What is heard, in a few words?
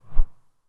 Fast Swish; Whooshes